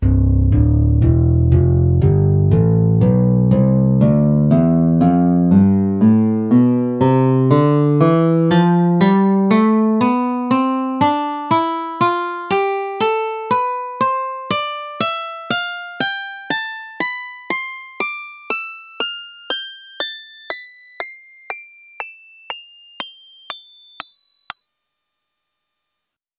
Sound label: nylon string guitar tonleiter samples